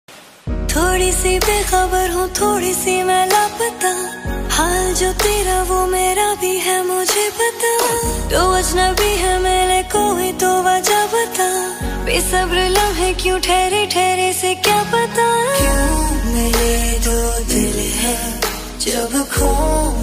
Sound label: female version ringtone